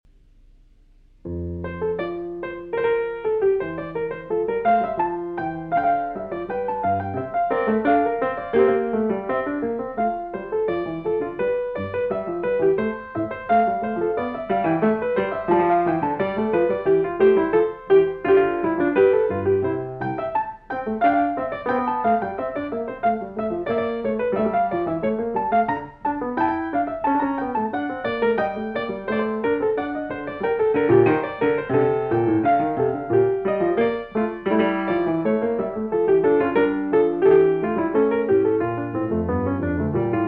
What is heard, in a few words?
Sinfonia In F Major